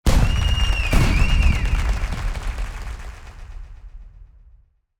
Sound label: Congratulations; Yu-Gi-Oh! Master Duel